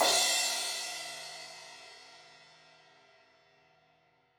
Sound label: Crash 16in edge, Cymbals; Deeply Sampled Drums